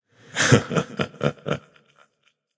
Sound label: laugh; smite; vamana